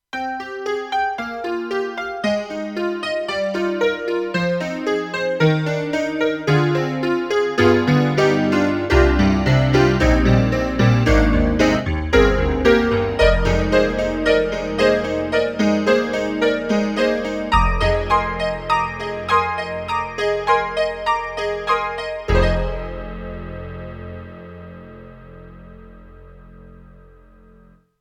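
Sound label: YAMAHA TG100 Honky Tonk, piano; GM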